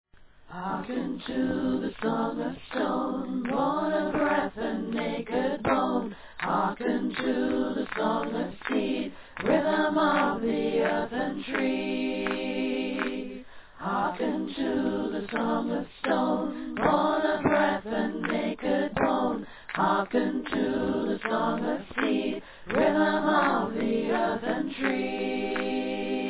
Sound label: Earth Song, chant; Elemental Chants